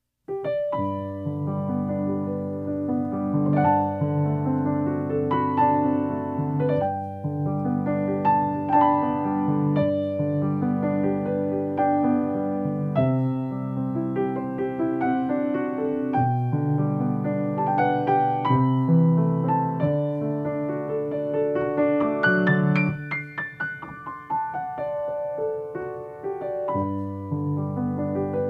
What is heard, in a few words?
piano